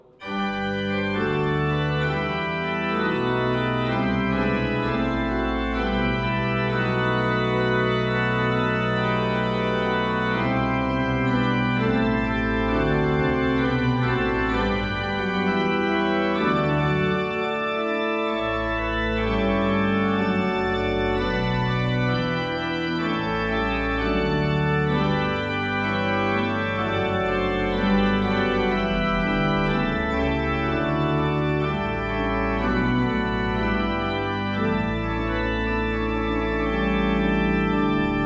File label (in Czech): Principal 8, Oktava 4, Flauta 2, Mixtura; Vsemina